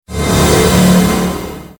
creepingdeath effect; abilities

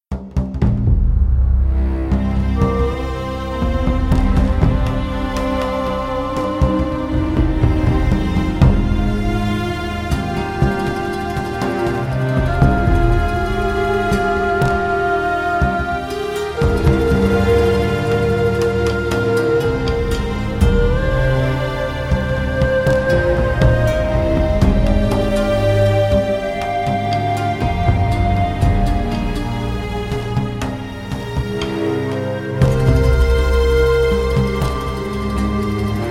music ambient

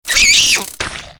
horde giant mosquito death; mobs

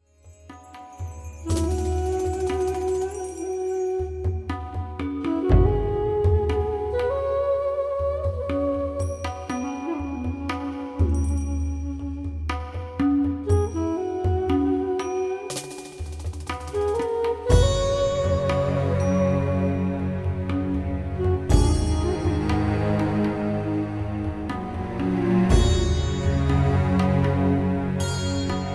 desert ambient

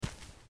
biped run

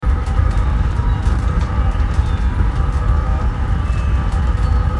firespells warmup; abilities

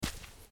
biped run

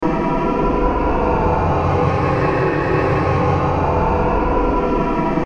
creeping death warmup

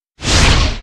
mystic missile cast

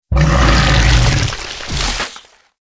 horde biter death; mobs

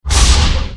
enfeebling blow cast; abilities

